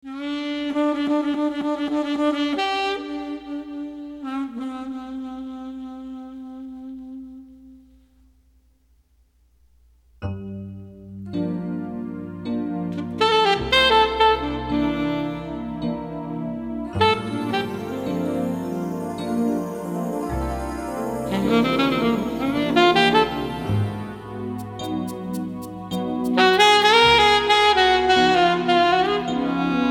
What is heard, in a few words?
Slow Sax